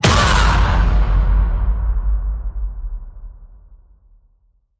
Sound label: Vocal Fury Woman Gladiator Hit